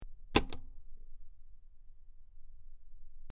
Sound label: Button Large Button P, switch clicks